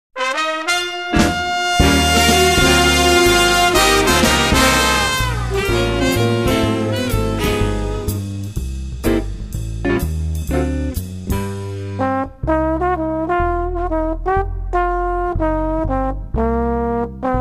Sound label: jazz